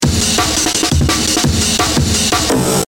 amen break

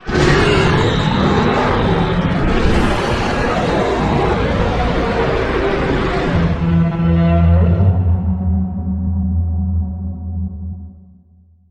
roar; omegadominus

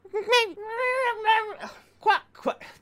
penguin

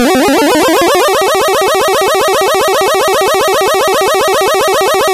charge nes

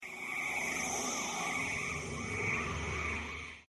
ufos

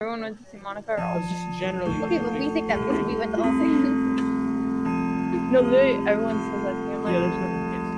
grandfather clock

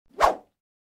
whoosh

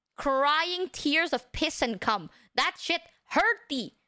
crying

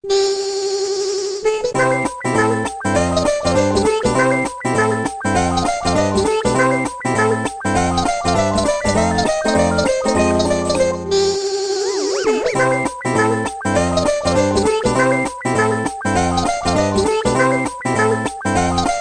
menu; vib-ribbon

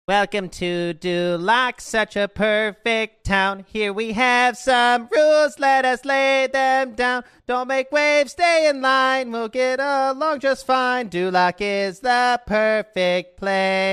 corgi sings

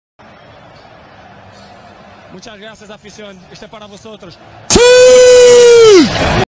suuuu loud; ronaldo

